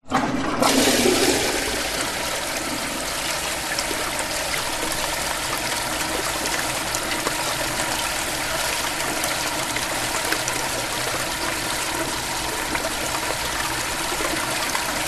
public places public toilet urinal flush metal

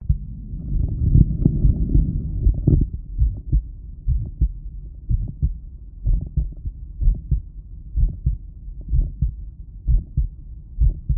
heartbeat holding breath at tricuspid

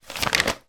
foley magazine page turn; zapsplat

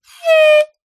sport air horn short weak blast; zapsplat

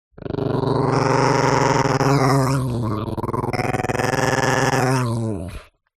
DOG Chihuahua Growl Creak; ANIMAL; smartsound

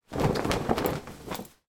foley clothes trousers shake

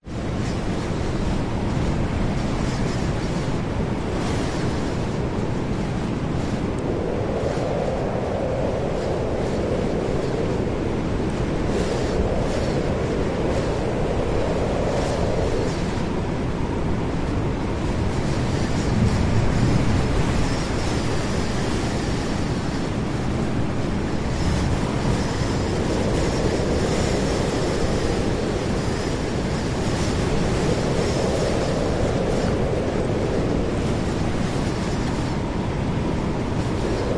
wind hurricane very strong howling; nature

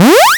leisure video game retro 8bit jump

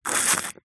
human drink tea coffee sip slurp

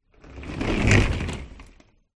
household pull along shopping trolley pass by

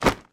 foley bag plastic bag for life put down; zapsplat

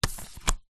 leisure playing card turn over on table; zapsplat

